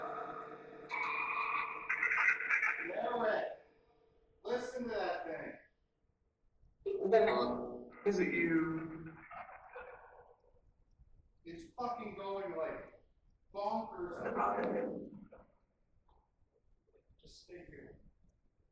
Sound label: Laundry Room Phasma Box